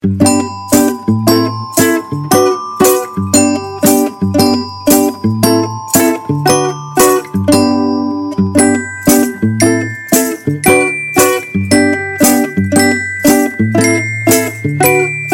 Acoustic Bells

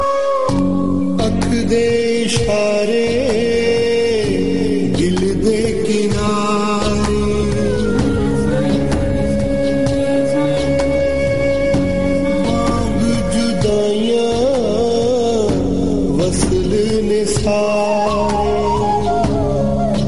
Male

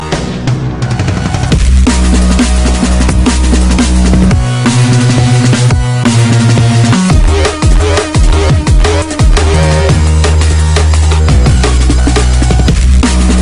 Dubstep Dnb

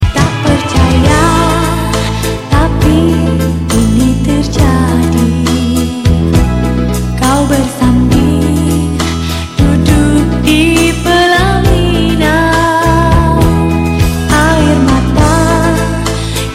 Malay Song

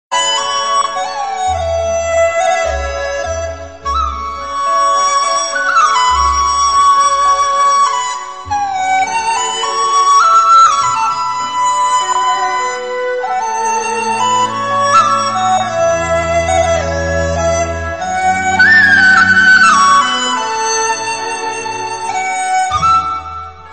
Chinese Flute

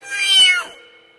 Cat

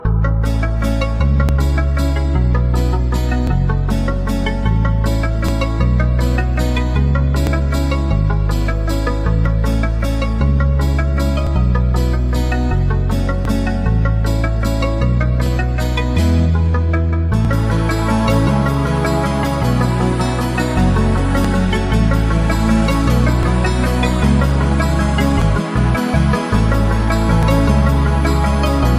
Heaven Music

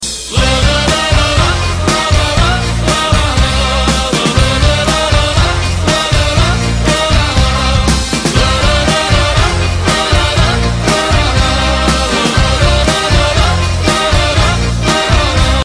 Lalala Ringtone

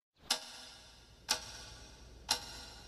Anime Clock Tick

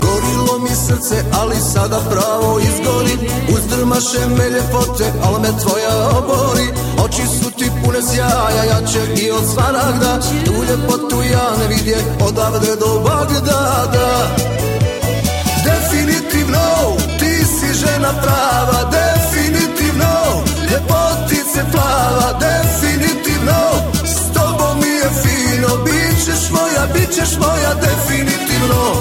Tambura

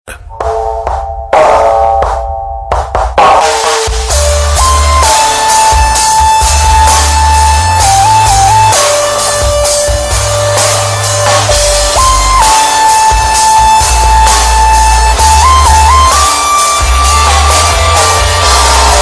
Metallic Beats

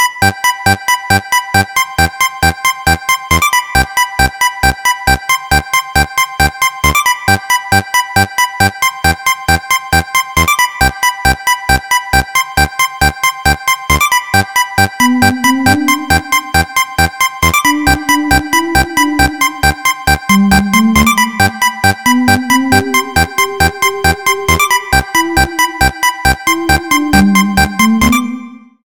Electro

